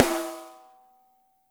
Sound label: DRY, snares; Spectrasonics - Burning Grooves CD2